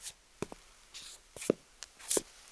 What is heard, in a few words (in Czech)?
kroky; Mafie 1